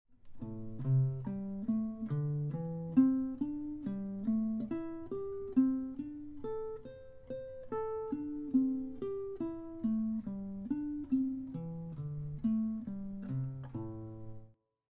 String skipping pattern, Minor pentatonic scale, guitar